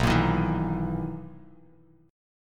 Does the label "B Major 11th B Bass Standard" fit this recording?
Yes